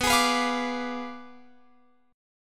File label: B Diminished 7th, down, Guitar, Open F; strummed